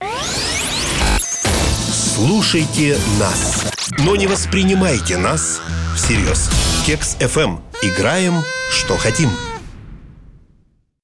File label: jingle